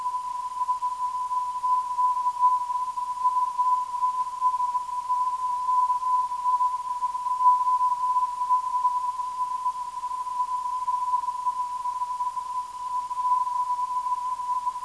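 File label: 390.15kHz, non-directional beacon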